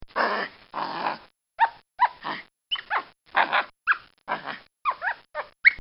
Capuchin Monkey; Monkeys (Sound Effects:Animals)

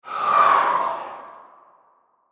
Whoosh Ghost By, Horror, Pass, Howl; Ghosts; BlastWave

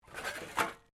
METAL SCRAPS MOVE; Metal (Sound Effects:Materials)

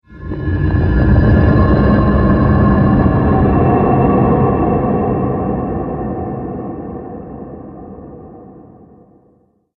Epic Scifi Horror, scary tone

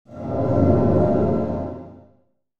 Whoosh Low Shimmer; Blastwave

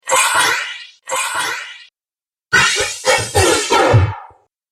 Dubstep Scifi Effects

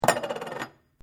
China cup placed table spins; Set Downs; Objects

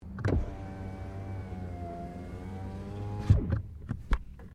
Car Toyota Corolla window close; 2009 Toyota Corolla hatchback; Cars; Vehicles; SoundEffects-Foundation1

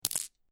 Marble Impact With Several Marbles; Small rattle of impact from marbles or pebbles.; Miscellaneous Toy Sound Effects

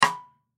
Drum Snare; Snare 14 Inch Pacific Rim Shot Rattle; Drum Kit Sound Effects

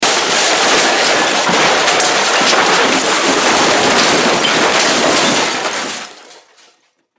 Crash Junk Debris Long; Sound Effects:Materials; Blastwave

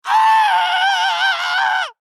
Horror Scream Male; Screaming; Voice

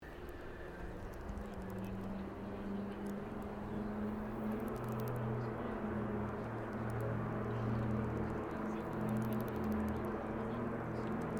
AIRCRAFT DOUGLAS DC3 TAKEOFF; Qantas 1942 DC3; Airplanes; Vehicles

